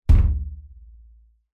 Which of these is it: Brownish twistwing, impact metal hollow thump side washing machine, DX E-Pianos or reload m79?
impact metal hollow thump side washing machine